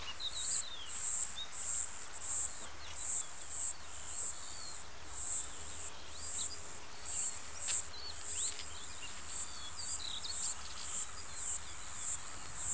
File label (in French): Enregistrement Cigales; à Lézignan-Corbières (11) sur un talus de la plaine agricole, le 02/06/2017